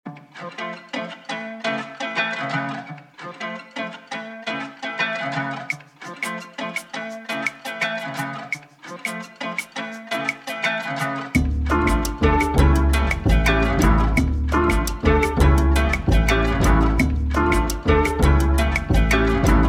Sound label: Dmin 85 bpm